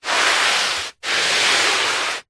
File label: foley foot scrape long scuff carpet